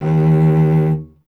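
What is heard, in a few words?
strings cello D#; Miroslav Vitous; Solo Instruments VOL-1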